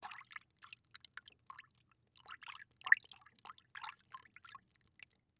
Liquid Water Movement In Metal Bowl Finger Splashing Long